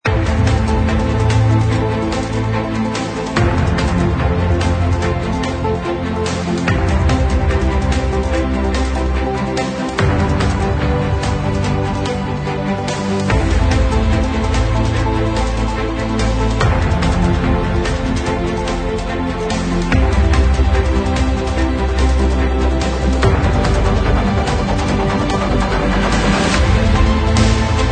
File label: Epic Technology Loop Version; 音乐素材 史诗科技 Epic Technology